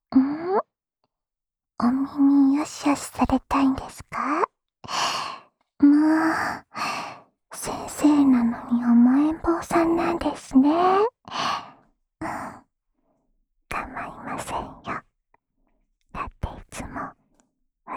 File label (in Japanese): 安眠用お耳ふーふーループ（右耳）